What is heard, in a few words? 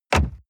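sports car interior glove compartment close short; verkehr-transport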